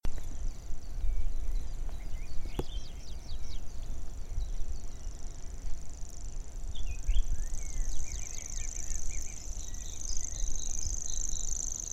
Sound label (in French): Locustelle tachetee; Lorry